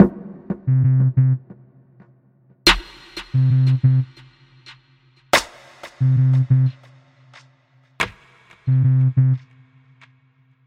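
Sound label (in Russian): минимальная электронная петля с перкуссией; dlya sceni filma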